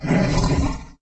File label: attack light; tulrus